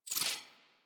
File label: Briefcase Launcher Aim Exit; Warframe; Weapons; Corpus